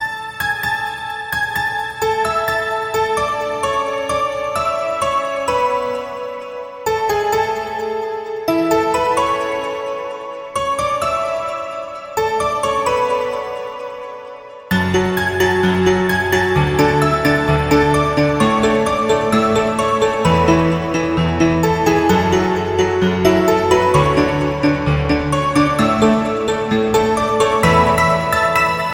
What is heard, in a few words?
musical cell phone ringtone